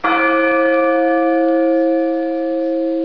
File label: bell sounds